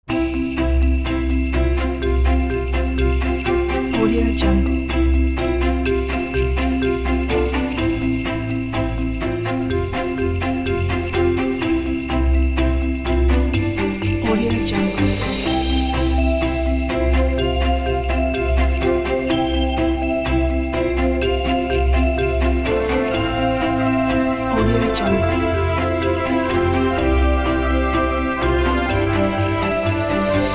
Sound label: fast action on holidays; krzysztofkurkowski